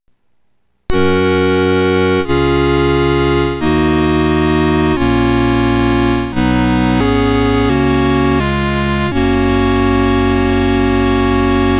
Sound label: amen (Danish 3-fold)